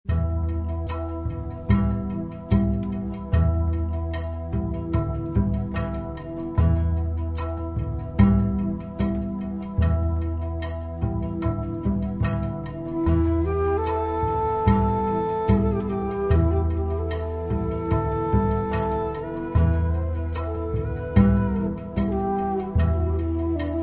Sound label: crystal cube